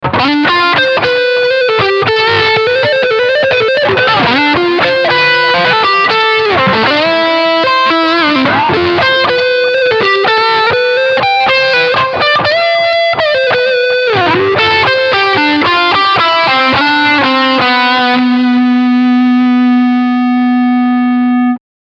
Leadsound Front

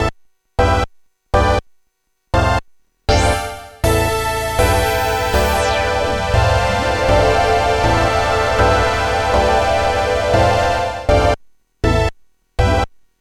MOD Devices MOD DUO X TAL noisemaker x4

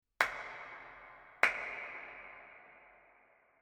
Claps Berlin Swimming Hall

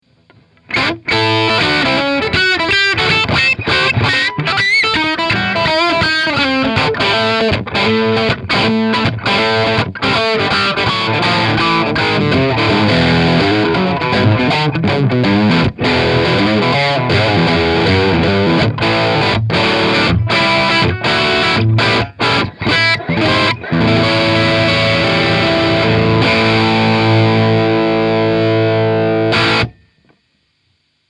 Crunch Bridge PU Humbucker

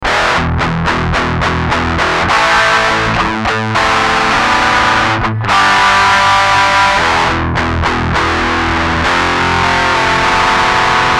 Distortion

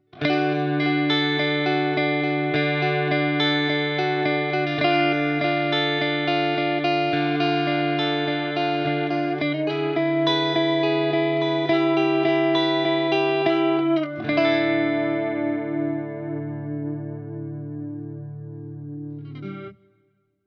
Eventide Tricerachorus Chorale warble

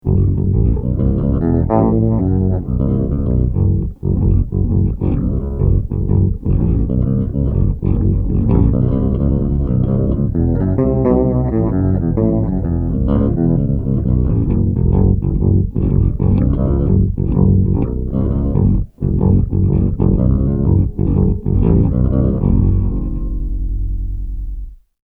Octave DOWN Fretless

